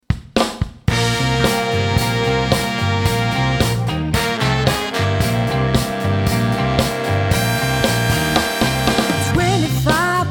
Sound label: Soul Motown Sounds; Orchestra; Fil Lorenz Orchestra San Francisco; Jazz Band